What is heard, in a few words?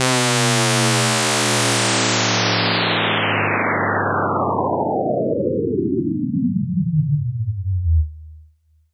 Sweeps Down